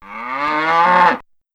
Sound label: Cow Moo